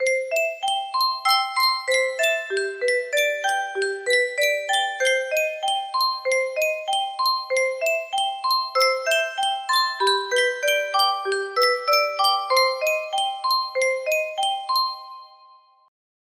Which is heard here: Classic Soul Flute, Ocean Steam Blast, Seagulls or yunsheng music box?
yunsheng music box